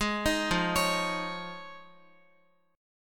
F Minor 7th Sharp 5th, Guitar; arpeggiated

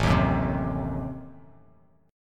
A Diminished 7th A down

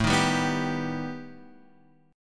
B Augmented 7th A

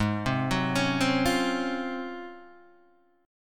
Ab 13th down Guitar DADGAD; arpeggiated; JGuitar

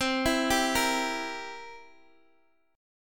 C 7th C down Guitar DADGAD

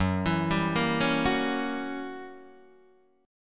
Gb 7th Flat 9th Gb Guitar Open D

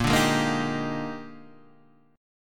A sharp 13th, Guitar Standard; strummed; JGuitar

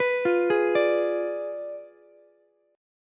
B Diminished 7th B down Ukulele; arpeggiated; JGuitar

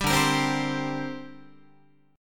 D 7th Suspended 4th Sharp 5th down Guitar; D7sus4#5 strummed

